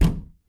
Closet Hit

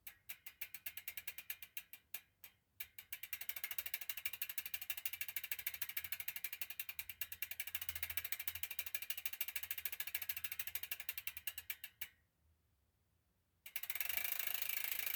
Rear Bicycle Wheel Spinning

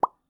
Message Receive

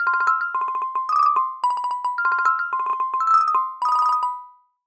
110 Mellow Xylo

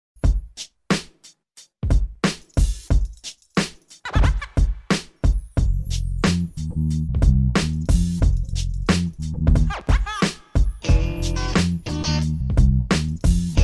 Hip Hop Base Instrumental

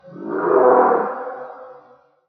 Dinosaur; Reinsamba

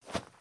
Leather Rustle

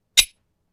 Horror Transition Sound; Vtkproductions

